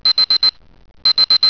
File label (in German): doppelbeeper